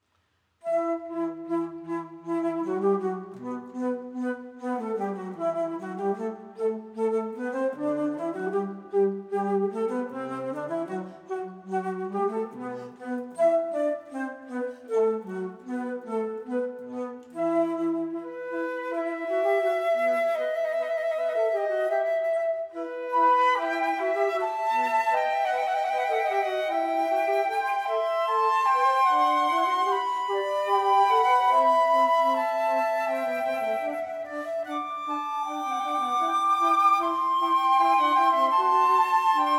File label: concerto in g major for two flutes; Quantz